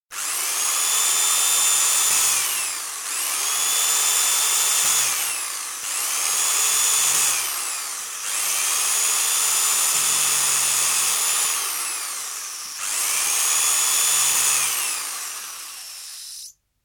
Electric Drill, series of holes drilled in quick succession; BBC